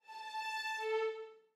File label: Dance of the Reed Pipes Violins 2; Nutcracker Suite